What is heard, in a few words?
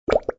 Bleed